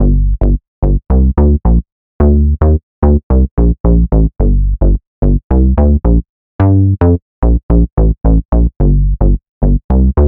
Sine, Bass, Bounce, Catchy Melody, G minor, 8 bars, 110BPM; Audialab EDM Elements